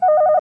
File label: intercom; romulan